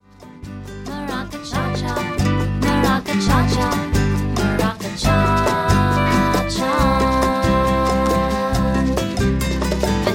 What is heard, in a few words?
maraca cha cha